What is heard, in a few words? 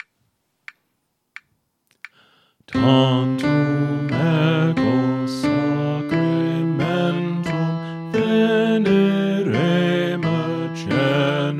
Soprano; Pange, Lingua, Gloriosi; St. Columba Choir Practice Tracks